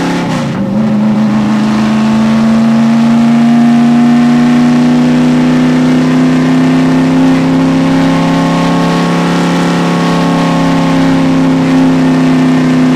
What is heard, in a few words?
cruise loop, vehicles junker; Source Engine; Portal 2